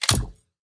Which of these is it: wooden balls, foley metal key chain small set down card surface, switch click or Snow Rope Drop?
switch click